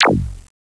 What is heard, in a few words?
BUTTON